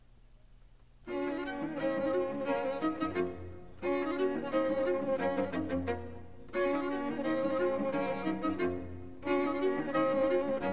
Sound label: Cavatina． Adagio molto espressivo, String Quartets; Beethoven; Budapest